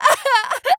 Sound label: crying; spiritheroes; Ada Final